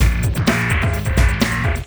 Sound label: CB LOOP; Zero-G; Chemical Beats